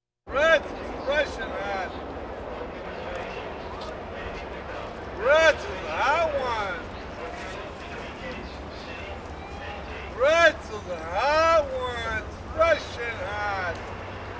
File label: Crowds Race cars Baseball